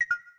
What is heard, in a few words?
Close, Keytone sounds; LG GD550 Pure